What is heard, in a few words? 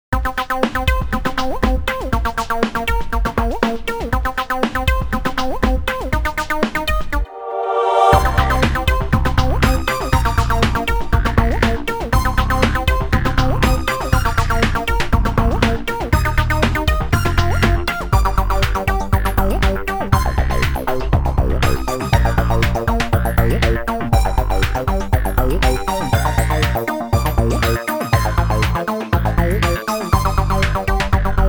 Electronic, EDM